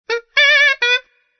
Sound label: SAXOPHONE